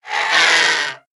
Black Whelp Enter Play

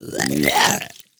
Tar Creeper Attack

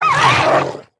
Infested Wolf Death

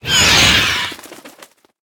Attack

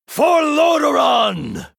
Male Human Attack

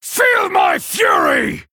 Male Night Elf Attack; Kurtrus; Cariel

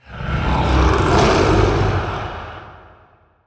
underlay death; Shaku The Collector

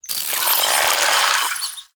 Glowfly Play

